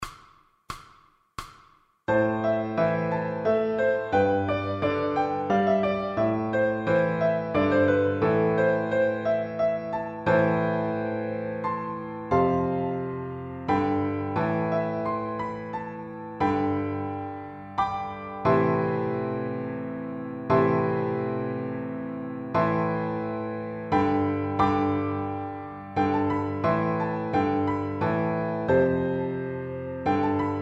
Piano Accompaniments for Violin; Grade 3; Cam Ye O'er

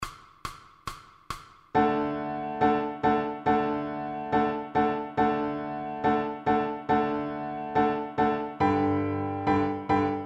Piano Accompaniments for Violin; MTB Grade 2

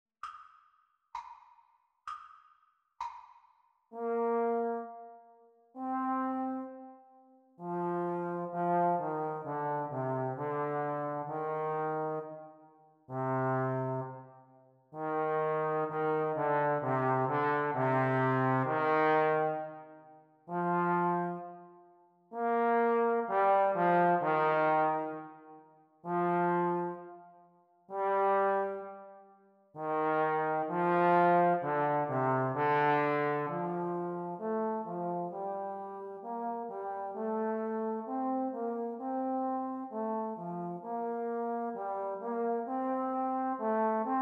Playalong for Eb Horn Practice Speed

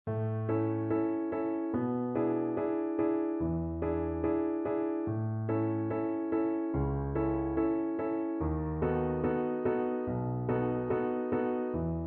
Introduction to Runs high voice accompaniment; Lesson 7; MTB Singing Vaccai; Grade 4

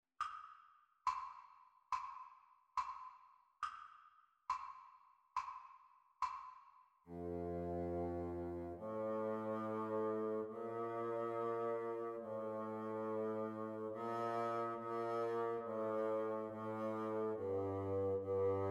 Bassoon Duets; Practice speed

